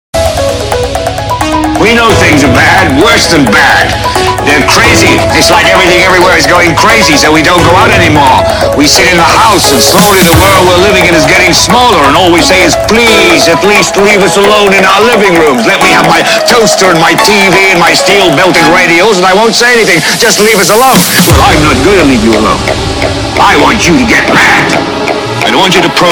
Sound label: quack remix